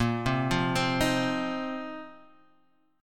Bb Suspended 2nd Suspended 4th Bb down Guitar Half Step Down